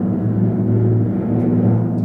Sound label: Kettle Drum; Drum & Bass; Percussion Fills FX; Sonic Foundry (Sony Creative Software); Futurist Drum & Bass